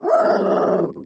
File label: wookiee m attack